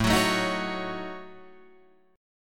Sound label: A 6th Flat 5th, Guitar, Drop D